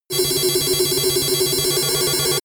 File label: futuristic computer